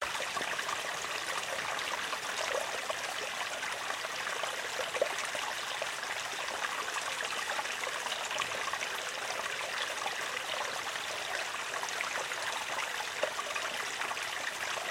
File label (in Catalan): Torrent hivern migdia